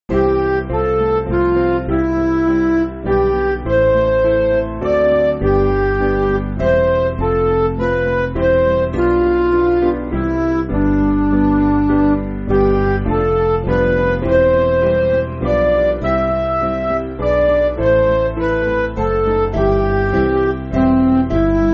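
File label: Music Backing Files for Churches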